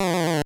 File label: Pain